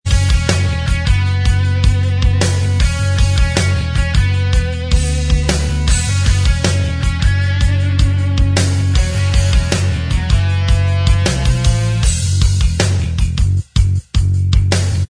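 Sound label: instrumental track; shorter edit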